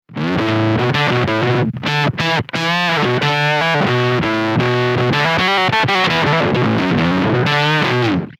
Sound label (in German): Fuzz + Oktaver